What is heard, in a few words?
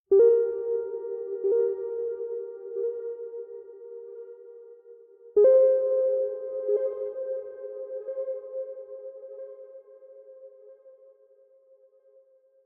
Pings; Martian Chess Audio